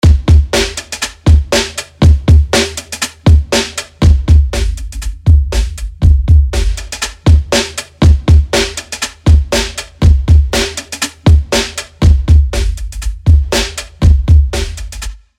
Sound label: Drums Drum Machine